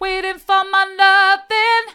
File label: waiting for my loving mid, vocal